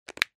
BOTTLE PILL

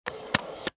CAMERA SLR

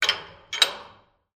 Door Metal Outer Thin Unlatch

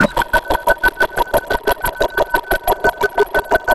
Computer Data Heavy Crunching Computer Data

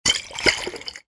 Bottle Glass Whiskey Jug Shake Lid On

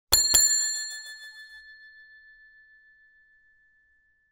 BELL DESK

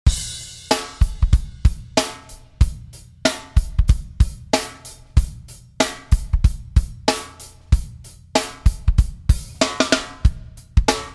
Shuffle Board Acoustic Drums

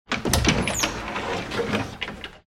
DOOR WOOD

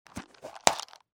CONTAINER PLASTIC

